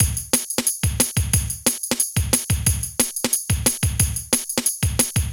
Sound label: Good Beat, Drum & Bass, Beats & Breaks; Fluid Dynamics